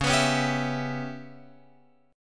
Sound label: C sharp Minor 7th Flat 5th C sharp Guitar Open F; C#m7b5; strummed